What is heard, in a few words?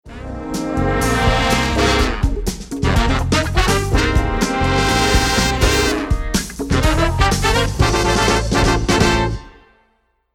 bomb ten sec count